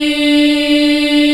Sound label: D3 child, Choir; CHILD IHF